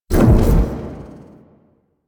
Omicrus Proj Hip Impact; Warframe; Quests; The New War; Weapons

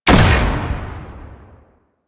Prime Shot Secondary B, Bard Rifle; Weapons; Warframe; Tenno

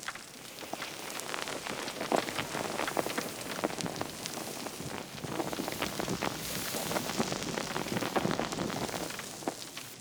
Candle Brazier Loop; Warframe; Clan Dojo; Dojo Props; Dojo Dagath

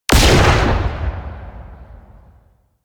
Secondary Barrel Fire, Weapons; Warframe; SU Modular Guns

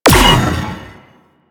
Space Fighter Coil Agent Fire; Warframe; Enemy Ships; Space Fighter Sentient Coil Agent

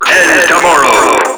Tentacle Swing; Enemies; Ancient; Abilities; Warframe; Proto Infested

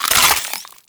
Infested Beam Pistol Reload A; Warframe; Weapons

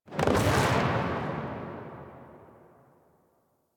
Spear Tail, Weapons; Warframe; Tenno; Priest Spear

